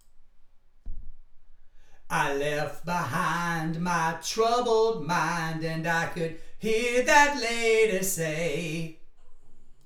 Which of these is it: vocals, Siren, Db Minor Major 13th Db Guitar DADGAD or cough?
vocals